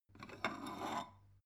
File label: stone bowl take soft